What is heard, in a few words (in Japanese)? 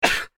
seki; easyrpg; Ib